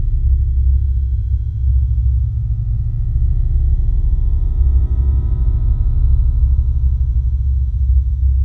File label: space tone